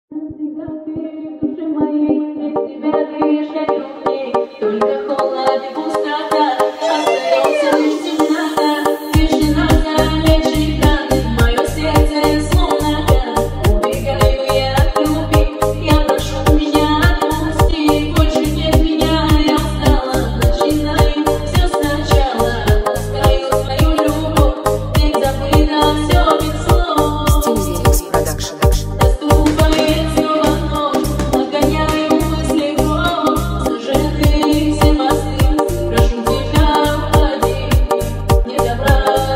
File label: lezginka